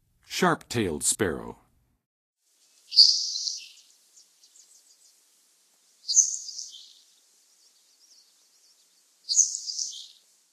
Sharp-tailed Sparrow, Songs of Birds of North America; Western USA; Western USA Songbirds